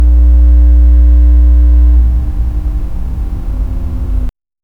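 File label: cavernous room tone gentle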